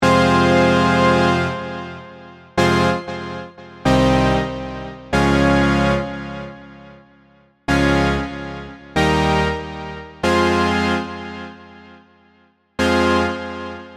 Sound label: power chords; bluewave by music sound software